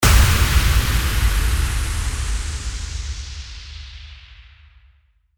IMPACT

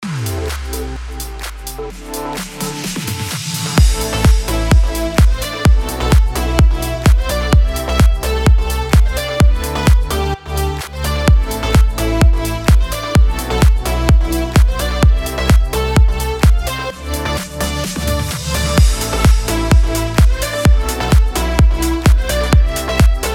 BED ELECTRO AMIN 128BPM

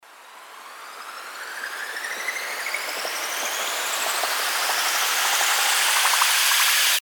RISER

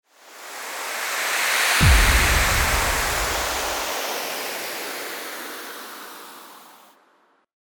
TRANSITION

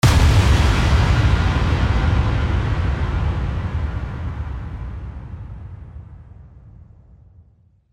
IMPACT

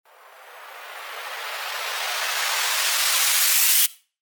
RISER

